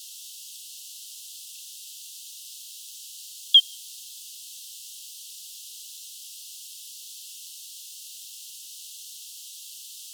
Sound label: N azoreum apparition explosive, Lago das Furnas; A-Lefevre